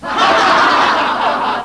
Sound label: crowd laugh